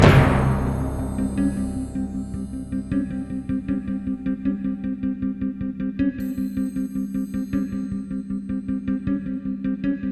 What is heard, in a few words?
Battle Stage Low; Disney Infinity